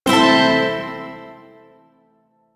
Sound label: Shawzin Pent Maj Chord; DE; Warframe; Duviri Shawzin